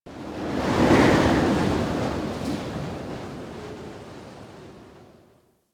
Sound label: Wind Gust; Warframe; Corpus; Ambience